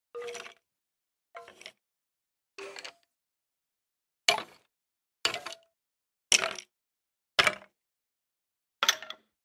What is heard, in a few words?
minecraft skeleton sounds